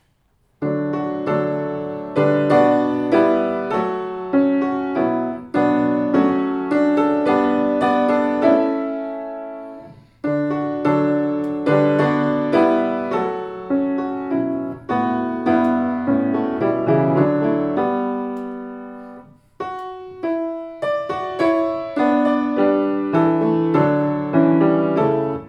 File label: Psalm 119 piano only; Do Not Forsake Your Servant Now – Psalm 119:1–8; Cantus Christi no. 228A